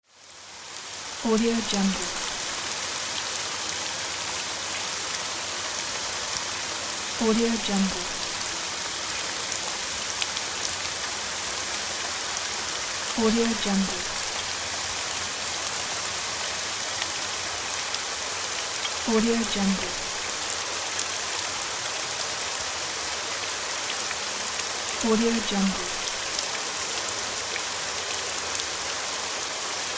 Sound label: rain sounds